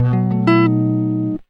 B MAJOR GTR, Nylon Guitar

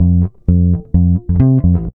REAL BASS, BASSLICKS; Ueberschall; Jam Box

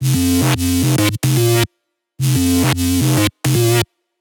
110 Fm Chiptune Grime, Melodic Loops; Electronisounds

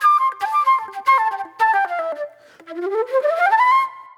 Flute, Disco

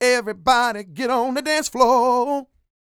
SING, Vocal Planet R&B & Dance; ILIO

